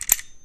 no ammo, weapons; q3rally

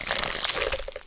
web harden

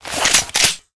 shotgun draw; weapons

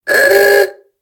horn; q3rally; gravelord

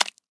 step; player

